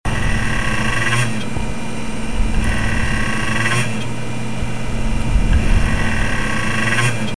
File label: whirring; blue-grey Mac G4